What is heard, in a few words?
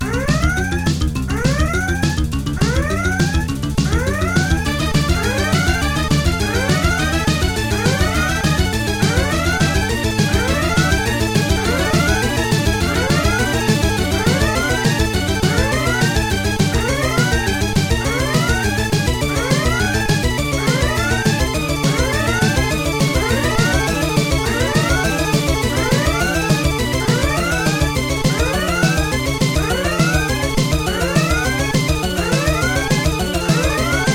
Multiball Restart, pinball; Star Trek; Data East